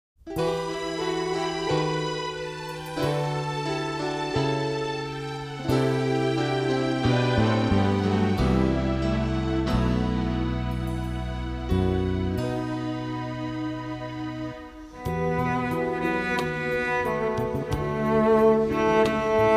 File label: Cello; AmbienceCello